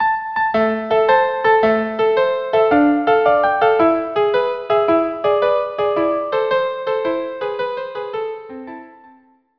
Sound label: tarantella napoletana violin viola; tarantella napoletana violin violin or violin viola duo